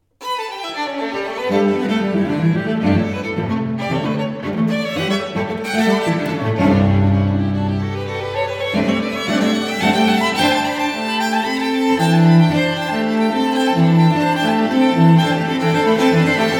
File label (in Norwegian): Udelt takt